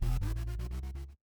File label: retro game power up